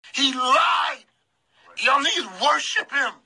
crying; ig live

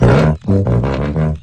tuba

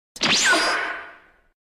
teleport sound; goku

